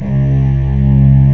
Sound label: STRING ENSMB; Greytsounds VOL-1